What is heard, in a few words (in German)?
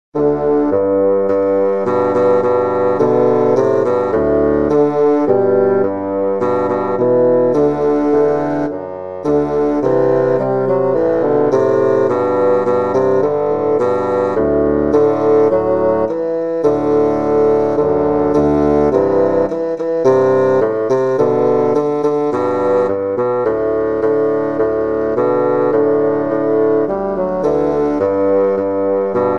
Trio; Kleiner Drache